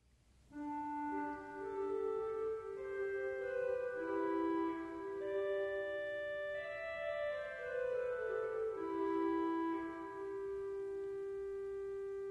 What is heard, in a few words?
Clarinet